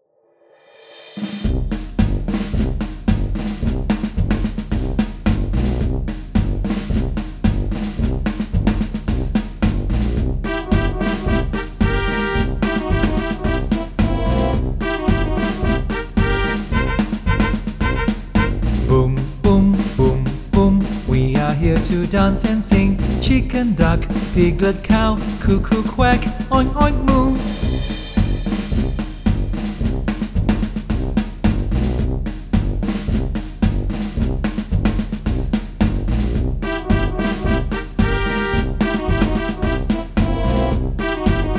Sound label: Singing The Animals Are Singing Fast